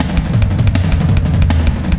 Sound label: 120 BREAK, DRUMS